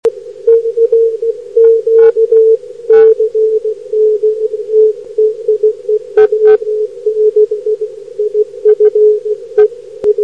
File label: cq; eme